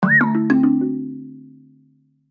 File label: message, Nokia audio themes